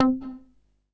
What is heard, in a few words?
Synths C; Vengeance Samples